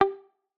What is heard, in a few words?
pluck